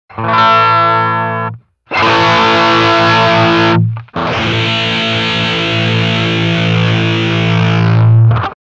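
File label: electric guitar distortion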